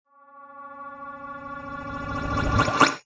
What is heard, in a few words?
Scifi Production Element Approaching; Suitable as science fiction film and game production